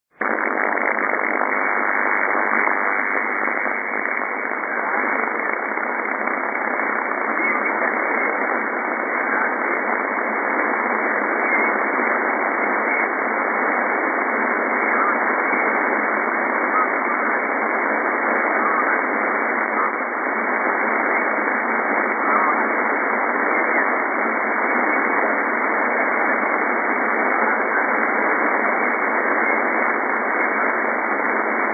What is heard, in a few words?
The Squeaky Wheel